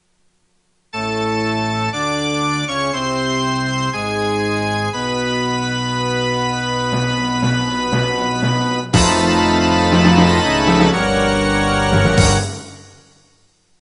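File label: quiz organ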